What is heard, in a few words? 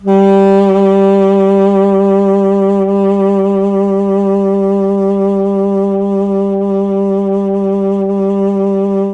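G2 tenor sax, mellotron; CHMB TNR SAX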